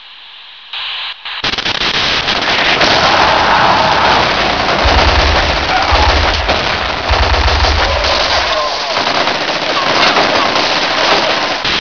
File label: radio battle